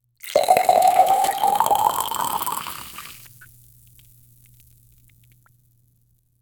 the sound of sweet sharba, beverage

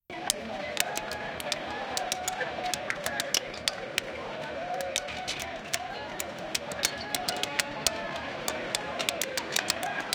large crowd working slave, labor

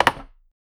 single pinball impact

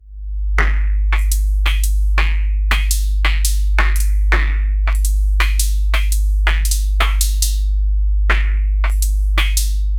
powerful outco, beat

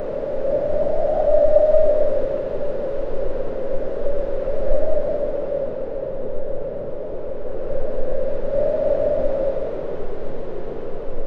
a harsh blizzard howling; cold

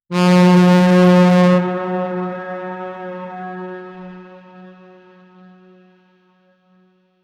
a powerful and energic mo, horn

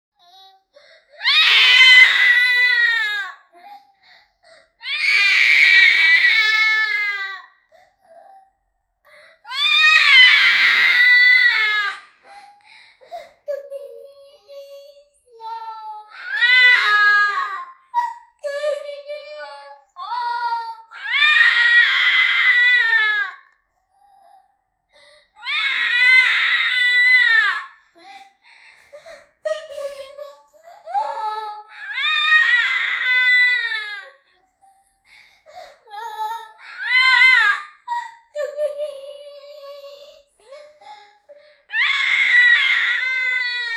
9 year old girl crying

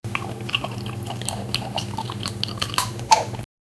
chewing

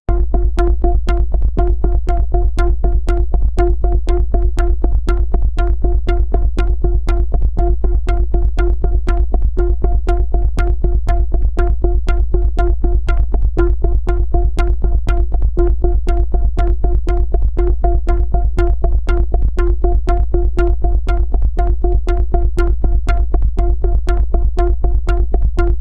techno minimal pulse

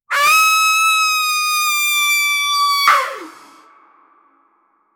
sound effect airhorn blas

